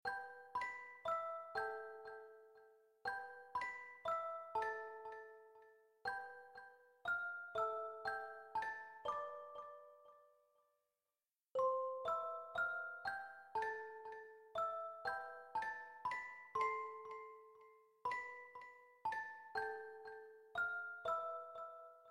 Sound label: melody